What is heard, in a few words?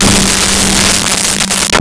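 Jazz Guitar G4; Nintendo; July 2020 Gigaleak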